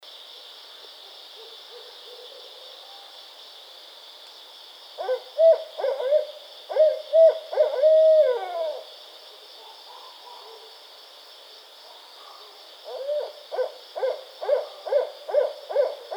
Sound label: Barred Owl